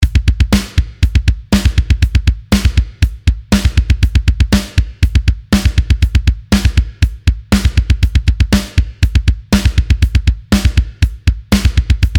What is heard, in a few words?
Double Bass Beat, drum